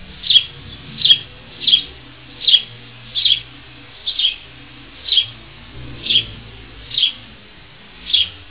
sparrow

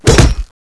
hit wall, brown bess; weapons

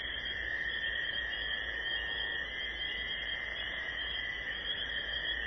frogs, ambience

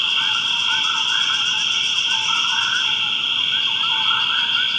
night forest, ambience

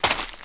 player grass

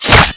hit, charleville, weapons

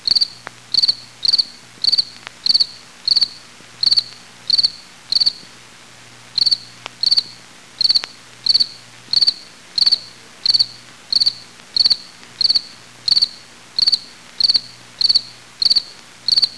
cricket loop

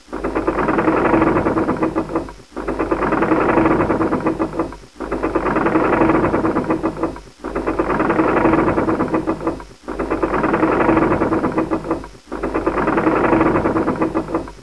mill

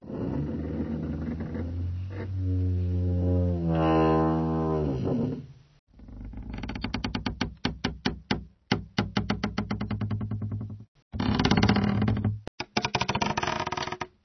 bridge creak

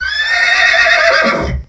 horse

